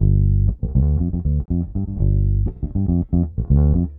Bass, 70s Analog Funk

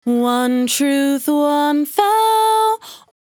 100bpm Bm, Velvet Vocal; Zero-G

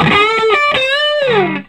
SOLO, Vintage Blues Guitar; USB Soundscan vol.22

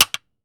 clapper, American Natives; E-MU Formula 4000 Series Vol. 4; Earth Tones

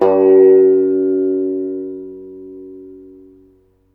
SITAR G3; Spectrasonics; Heart of Asia

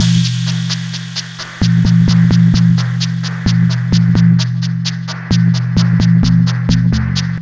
Octave Down Loop 130BPM, DrumLoop Ghost Layers

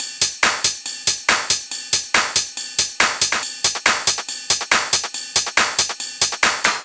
drum Loop 140BPM Full

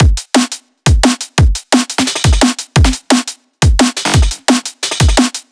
Drum Loops, 174; M8; Electronisounds

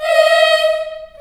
D#4, Choir, CHILD; CHILD IHS

